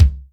SoTight, Yamaha DTXpress Acoustic Kick

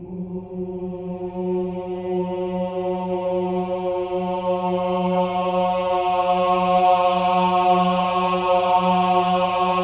OH-AH F#3, choir; Spectrasonics; Symphony of Voices

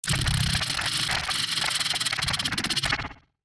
flap

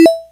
blips, opl3

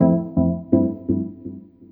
E PIANO 125, Keys; Houseworx

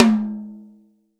TOM, Real Drum Kit, Rock Kit

